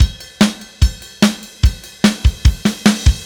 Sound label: Silos Beat Fill, Indie Rock; Sonic Foundry (Sony Creative Software); Indie Rock Anthems; Session 11 - Silos